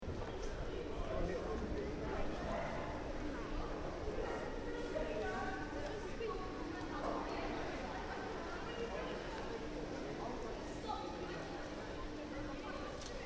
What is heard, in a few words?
Shopping Mall, Interior: Ambience, Small, Uk; Stores and Malls; Stuart Duffield